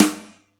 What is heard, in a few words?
Yamaha EX-5